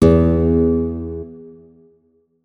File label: notes, guitar; Hideout Furniture